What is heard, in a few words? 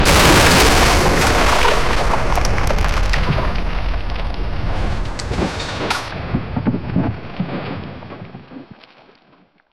massive tsunami wave viol; high detail